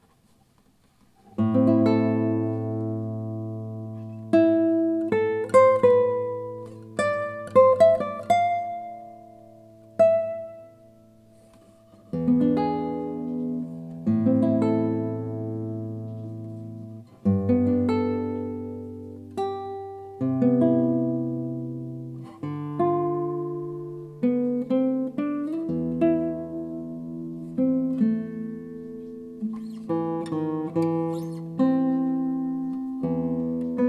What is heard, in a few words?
Prelude Am; Tarrega